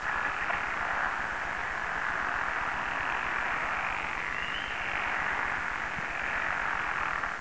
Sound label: pickerel, frogs